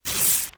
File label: FOLEY Paper Tear Fast; mono; Universal Sound FX